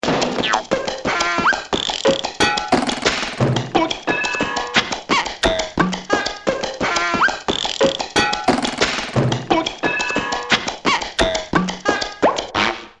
clowns

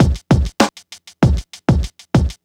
paltry, Drum loops Electro

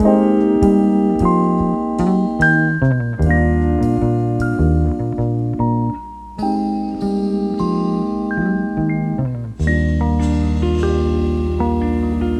keys

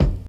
Kicks Soft

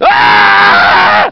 scream guy; Voice; halflife

